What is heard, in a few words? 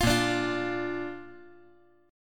A sharp Major